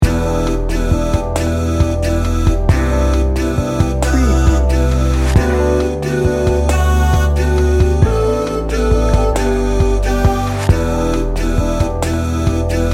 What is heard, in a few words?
soulful dreamer loop